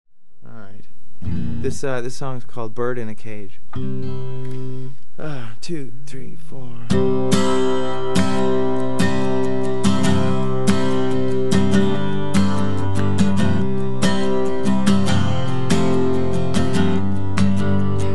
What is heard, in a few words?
acoustic